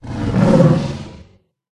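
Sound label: manticore attack voice bottom; mantikora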